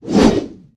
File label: swing scythe attack; queen girl